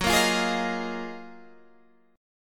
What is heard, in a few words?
Gb Major 13th